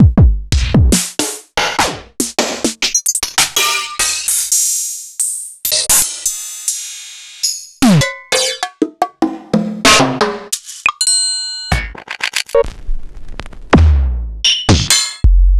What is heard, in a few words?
Kit; proteusvx